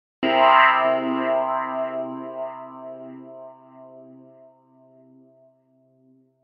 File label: C Dom7 Sharp5; ear training and sight singing; Fanatic’s Guide to Ear Training and Sight Singing Volume Two